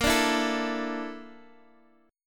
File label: A sharp Major 7th Flat 5th, down, Guitar, Open D